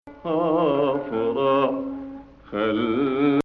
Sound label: Hijaz